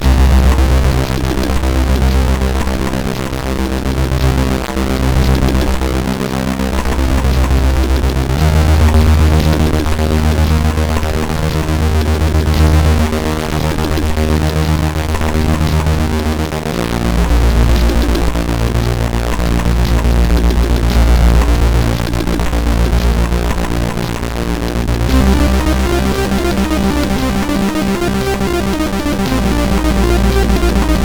Future Drums sid CSG8580R5

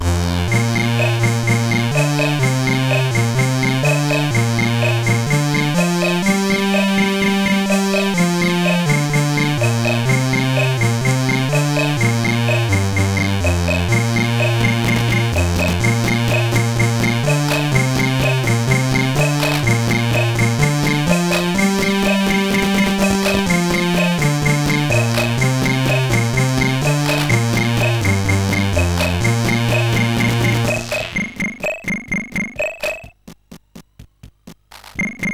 Traditional, CSG8580R5

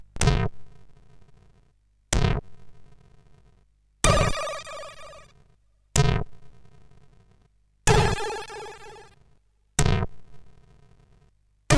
Hemsk, sid CSG8580R5; The Dungeon Master

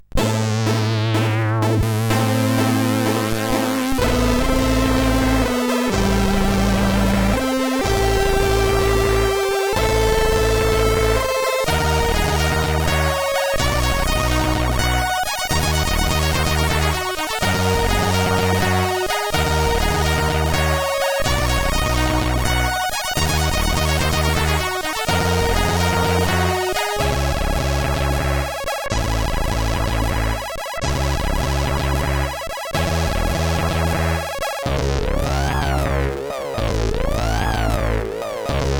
Modulation, sid CSG8580R5

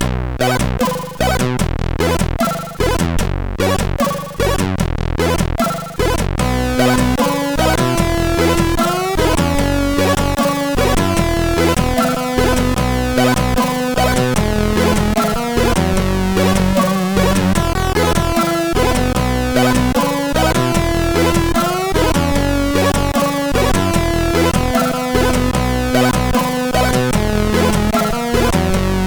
Reggae, CSG8580R5; HVSC

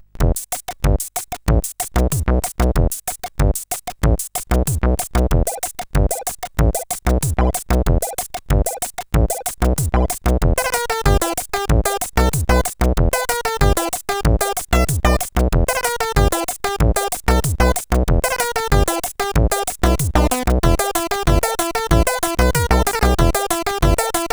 Jazz, sid CSG8580R5

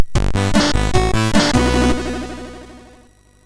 MOS6581R3, sid

